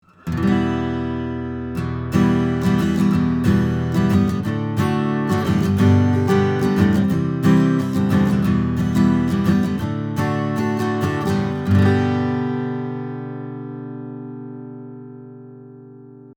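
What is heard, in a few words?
Sennheiser MKH40 Acoustic Guitar 30cm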